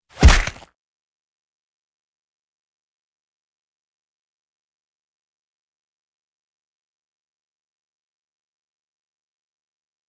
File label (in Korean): Fights, 싸움-전투; 헐리웃특수효과음